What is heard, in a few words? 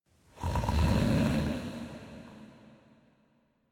Snoring Loop; Birdhouse